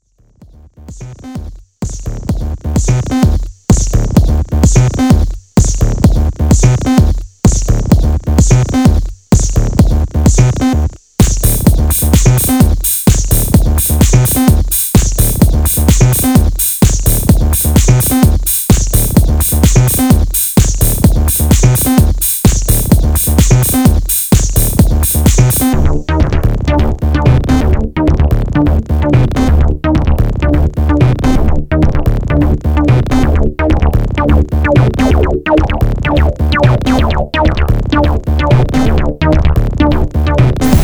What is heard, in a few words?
virus electro